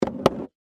Skateboard Up, Sports, Skateboard, Pick, Up, Wood, Surface; Skateboarding; BlastWave